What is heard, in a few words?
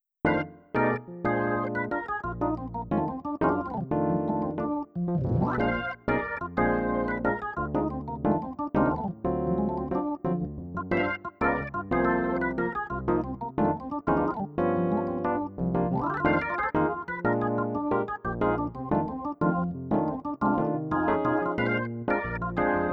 Jazz Organ, pianino cyfrowe; Yamaha NP-15 WH